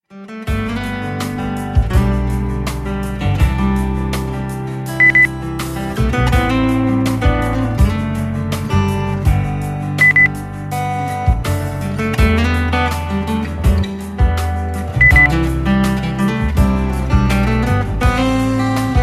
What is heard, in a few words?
soft kaminfeuer beep